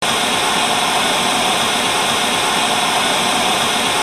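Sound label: television switched off; Телевизор выключен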